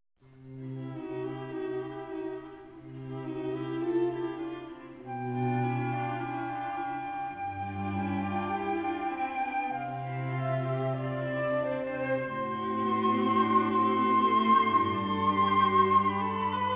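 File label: flute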